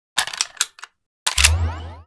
reload; empire med

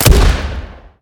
fire, 40mm; gmod; arccw uc commons attachments

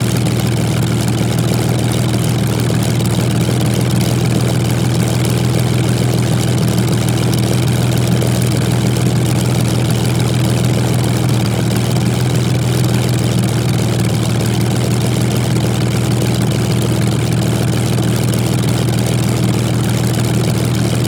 engine low, planes, spitfire; gmod; lvs planes

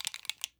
movement pistol; gmod; arccw uc commons attachments

